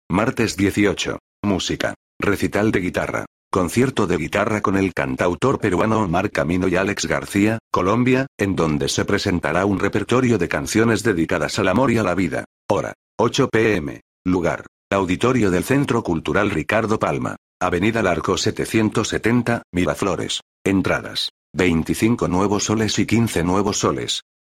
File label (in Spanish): musica recital de guitarra